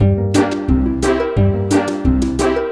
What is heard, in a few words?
Happy Full G, Lounge; Zero-G Kocktail Kollection; Construction Kitz (Lounge); Happy Timez Mixes 088